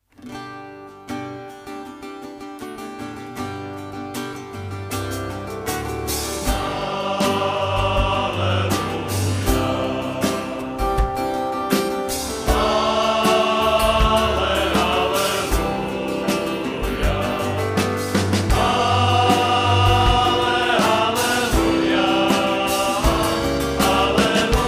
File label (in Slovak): aleluja